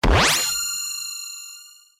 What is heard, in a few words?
Level Up